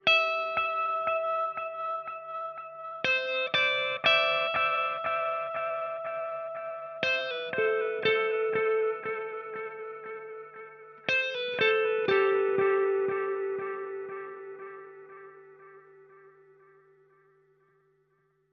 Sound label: Epic Lead Delay, echoplex delay; echoplex delay settings; Meris LVX